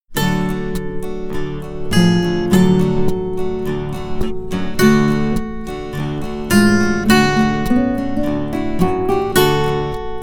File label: Guitar Ensemble